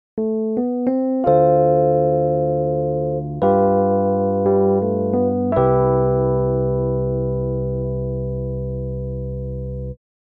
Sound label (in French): dm7 g7 cmaj7 melodie; jazz